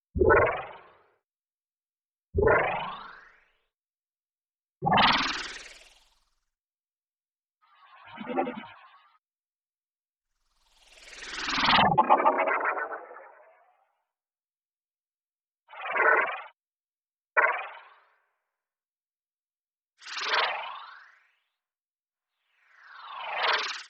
Periodic Cosmic High

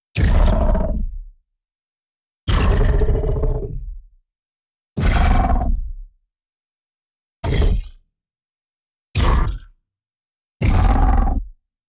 Impact High Splashes Echo

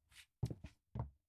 Sneakers Stomp; Clothes

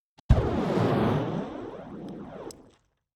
Fly By Bomb

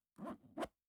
Zipper

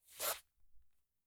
Belt, Clothes

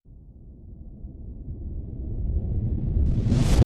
Riser

